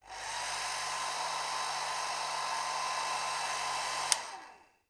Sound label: household hair dryer on operate off; sound effects one